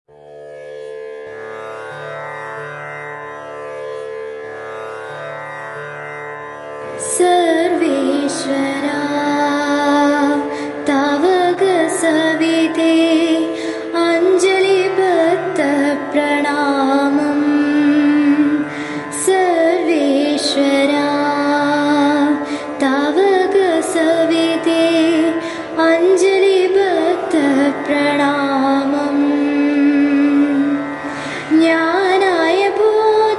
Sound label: Sanskrit Prayer